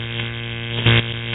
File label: sparks short